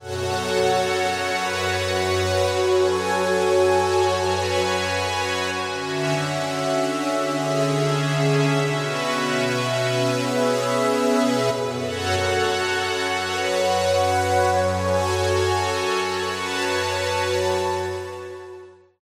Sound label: PAD Deep Ambient; Synthractive; syntheway